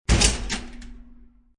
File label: Closing oven door.; Close Oven Door; Food Prep; Restaurants